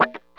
wah snip, Funk Gtr & Wah; PlatinumPhattCD2; E-MU Producer Series Vol. 8 – Platinum Phatt

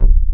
TYPIC C2, BASS; Q Up Arts; Dream Experience

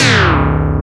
FM Perc; Rap

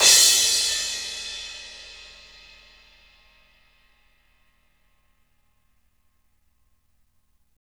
RIDE CRASH; Roland MV8800

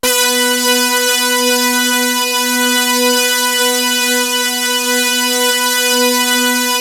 POPBRASS; MORPHEUS

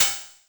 Open Hat, Yamaha FS1R

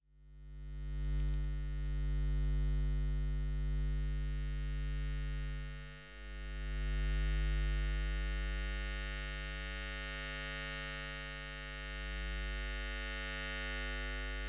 Electromagnetic Fields, Hummin; electric fields

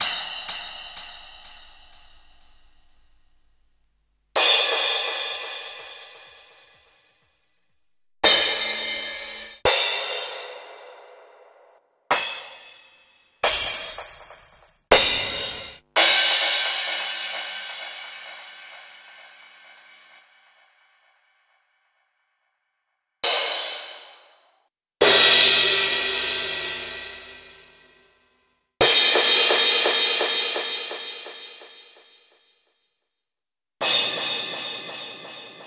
Crashes

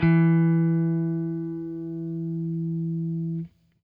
FENDER SFT, Guitar & Bass; Quantum Leap

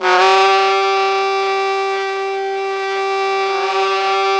GROWL SAX; Zero-G Ambient 1; FLUTE + SAX

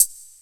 Tiny Hat, EMU Proteus-2000

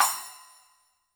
Splash Cymbal H, YAMAHA RM1X

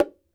Bongo High Med, World Percussion, One Shots; Sony CS

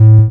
SHORT, SHORTNOIS

